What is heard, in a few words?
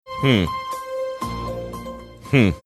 hmm hmm